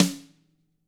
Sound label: TAM 1 RIM, Drums; ILIO; Double Platinum Drums 1; DW+TAMA